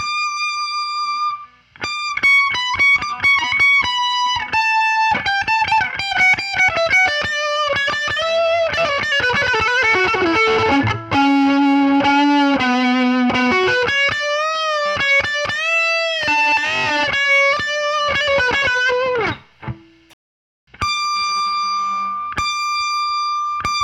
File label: ghostnotes